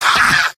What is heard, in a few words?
laugh short; heavy; mvm